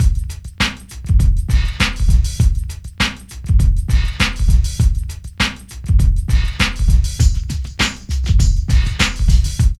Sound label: PHAT LOOP; E-Lab; X Static Goldmine 2